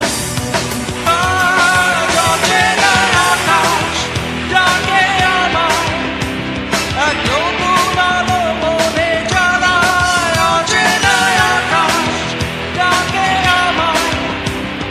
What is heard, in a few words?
Bangla Song